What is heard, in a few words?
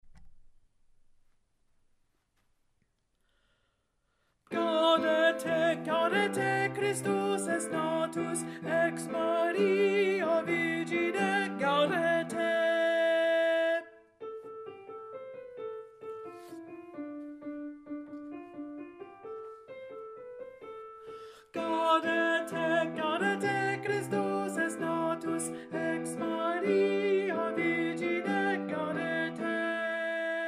Alto; Gaudete! Christus Est Natus, Pies Cantiones